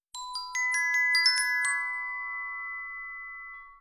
glockenspiel tonality 8k, More subtle effect closer to real-worlds artifacts